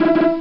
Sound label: collect; trapped2